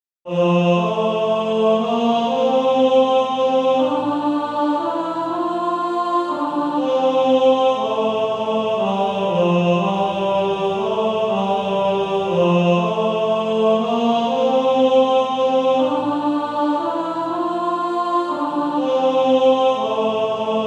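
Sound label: Male Choir, tenor 2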